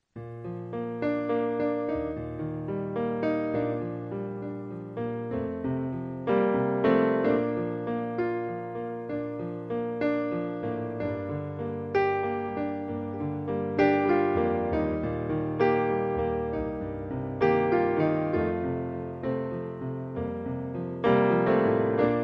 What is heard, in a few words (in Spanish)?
piano